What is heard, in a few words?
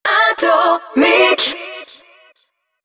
JINGLE acap; ATOMIC; HABILLAGE ACAP